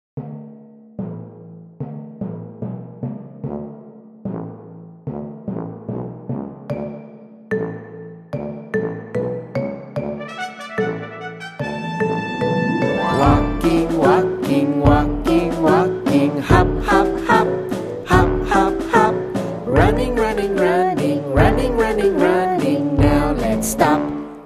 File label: Walking